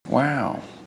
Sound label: bouncy creeper inflate; skyfactory4